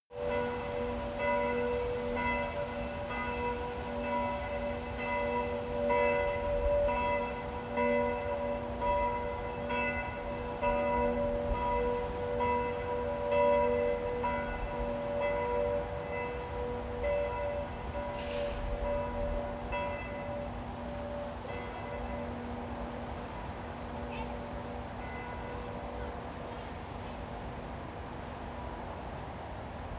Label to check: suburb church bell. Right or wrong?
Right